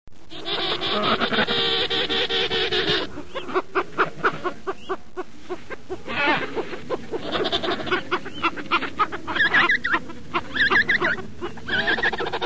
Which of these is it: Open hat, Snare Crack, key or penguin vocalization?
penguin vocalization